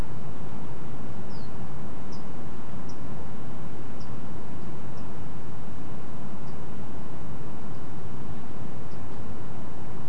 Pine Bunting series two diff calls, nocturnal flight call; Beijing